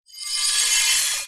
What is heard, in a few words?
sound design reversed glass smash delayed; zapsplat